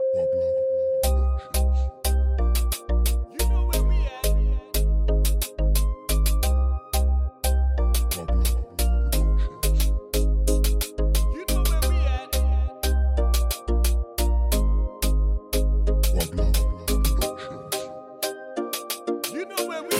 Reggae Dancehall

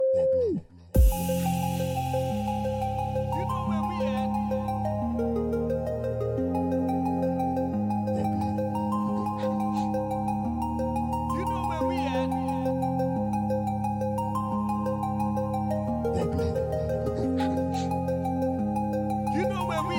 POP EDM

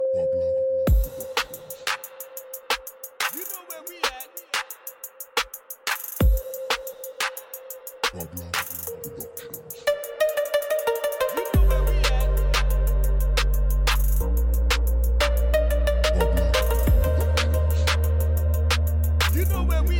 Reggae Dancehall

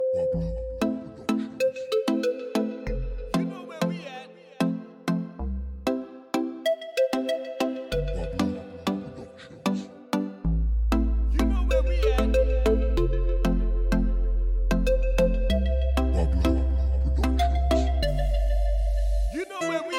Latin Reggaeton

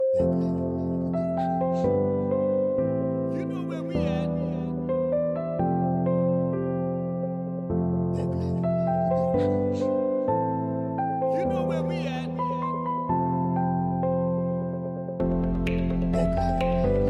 POP EDM

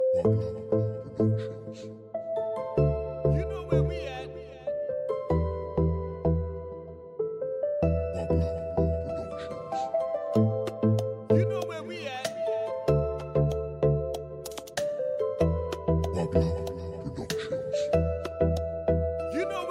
Latin Reggaeton

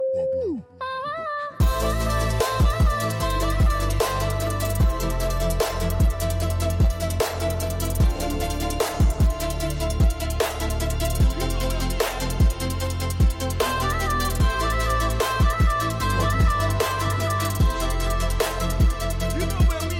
POP EDM

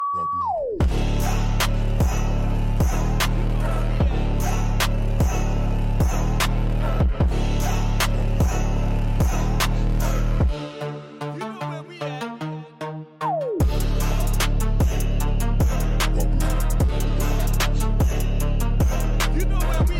Trap Pulse

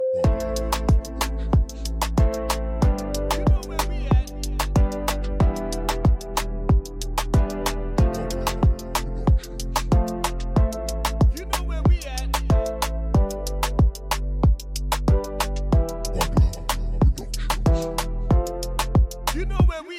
Latin Reggaeton

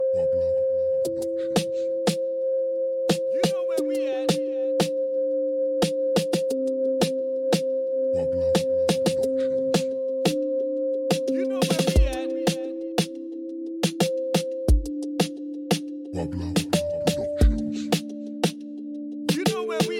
Reggae Dancehall

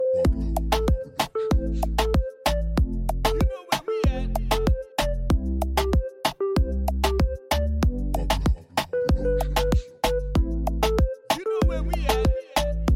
Latin Reggaeton

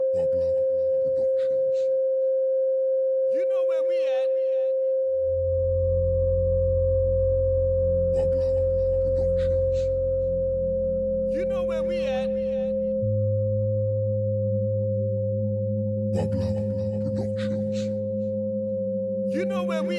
Reggae Dancehall

